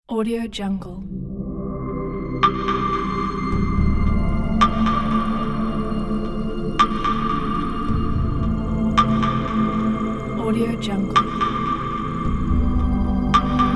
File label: meditation